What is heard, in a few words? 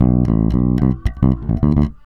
REAL BASS, BASSLICKS; Ueberschall; Jam Box